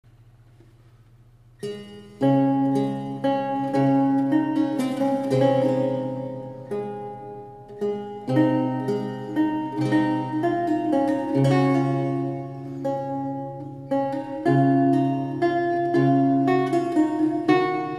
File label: siciliano